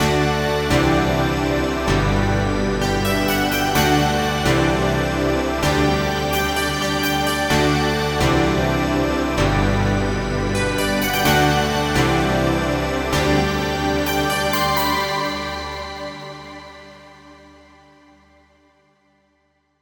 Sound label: 80's Style Chords, 128BPM